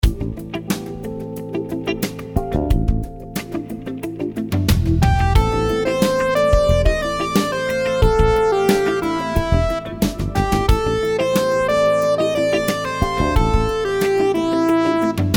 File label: rhythms 16s syncopated